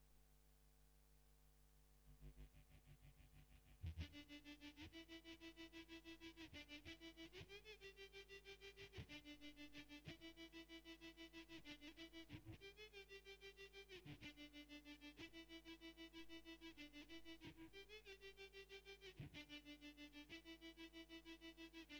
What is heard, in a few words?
tremolo